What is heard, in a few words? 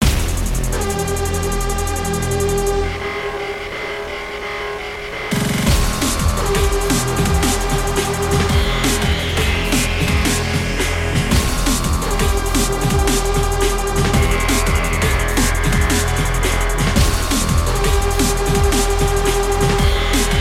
desert boss big boss